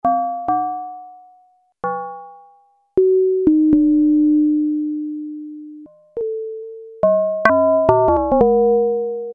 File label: Clockwork Bell; Alesis airSynth